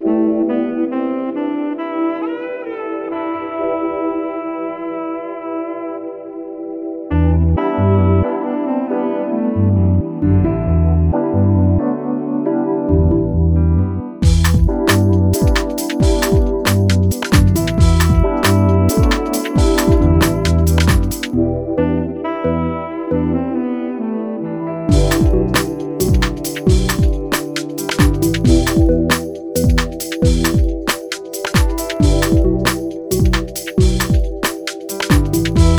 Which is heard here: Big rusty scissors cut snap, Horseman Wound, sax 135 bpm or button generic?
sax 135 bpm